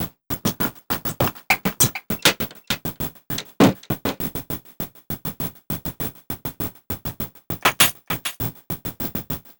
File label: Envelope Beat